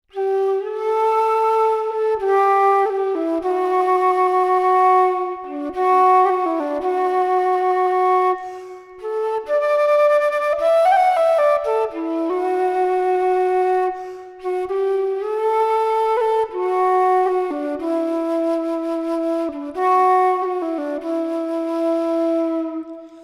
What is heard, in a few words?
Flute Air